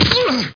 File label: block